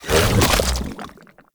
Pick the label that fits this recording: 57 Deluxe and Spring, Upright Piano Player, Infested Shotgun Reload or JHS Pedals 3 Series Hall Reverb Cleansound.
Infested Shotgun Reload